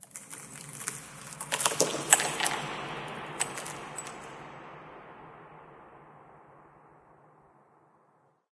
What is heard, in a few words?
amb rock debris, ambient organic; video games; source engine; portal2